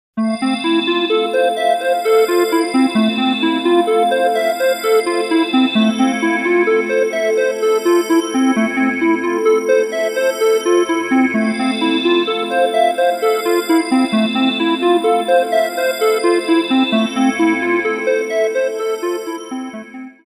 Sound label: pulse